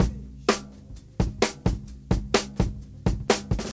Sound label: 130 bpm drum beat B key